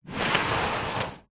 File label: wood box heavy slide concrete